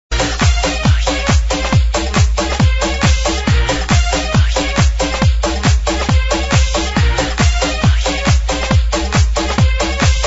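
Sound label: techno, ringtone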